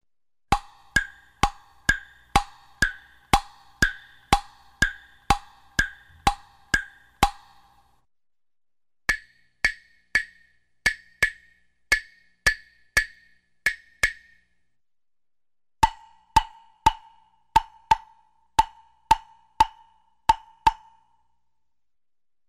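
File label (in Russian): мелодия на деревяшках постукивания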